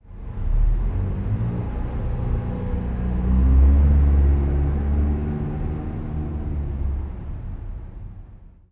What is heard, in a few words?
apc distant, streetwar; Half Life Model Pack; Half Life 2; ambient levels streetwar